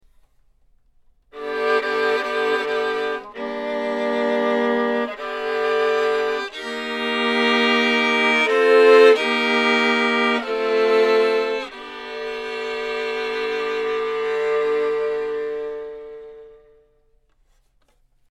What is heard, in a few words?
mix easy double, violine